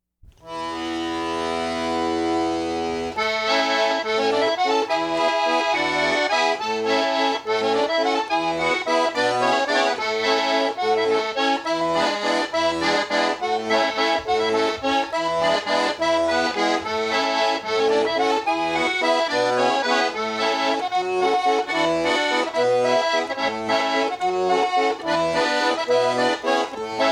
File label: Valzer - Waltz, Concertina; calabria